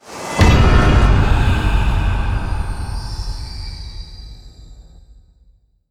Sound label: Horror Stinger; Warframe; Harrow